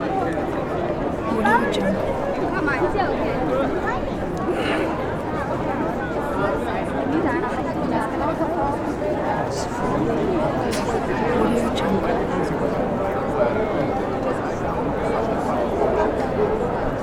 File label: crowded city square